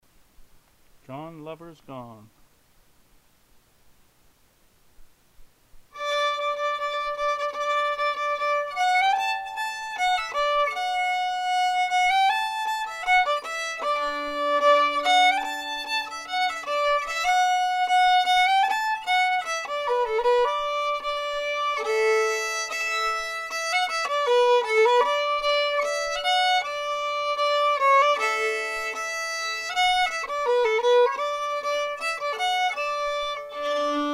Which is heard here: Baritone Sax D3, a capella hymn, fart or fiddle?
fiddle